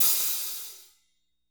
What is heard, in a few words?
hat, drum